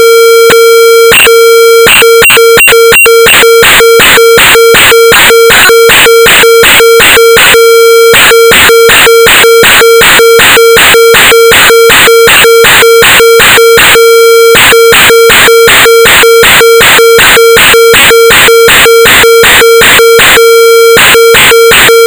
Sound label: RK002 ARP